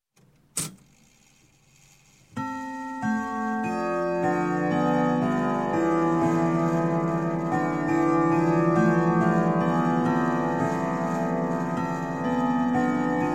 Clock, Grandfather Whittington Chime - Strike; Strike Four; Sound Ideas 2000 Series

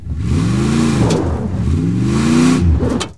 Auto Tires Spin Tires; Automobiles

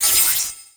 Pickup Magic; SFX Kit; Pickups

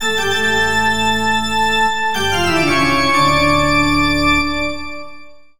Music Tocatta Horror; SFX Kit; Foley - Misc

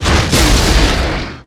Rocket Launcher Shot; SFX Kit; Weapons

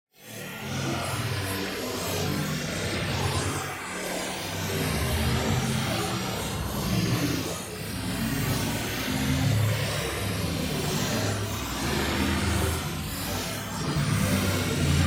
TEXTURE; Silver Sphere; boom library; Matter

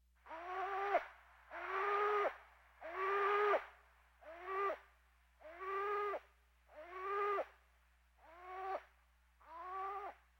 Birds

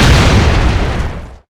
Explo Grenade; Destruction

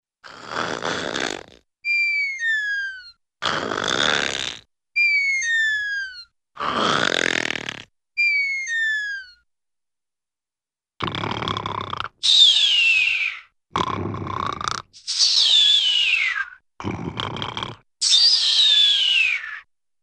Funny Man Snoring; Hollywood Edge; Cartoon Trax

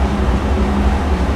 Machine Generator Loop

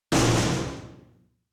prison; Inquisitor 2

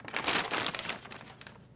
Rustle Newspaper Blow; SFX Kit; Foley - Rustle

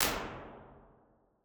MEDIUM DAMPING ROOM, Impulse Response

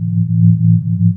Strong Magnetic Field Loop, Sci Fi; 1001 Sound Effects

